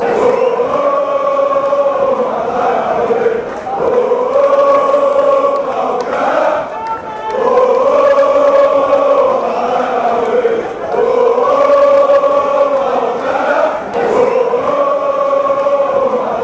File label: paok fc chant